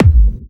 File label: Trance Kicks